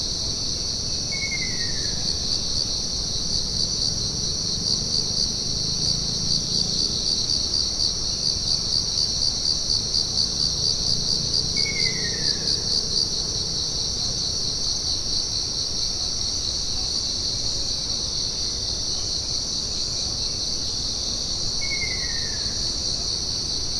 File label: Ruddy Kingfisher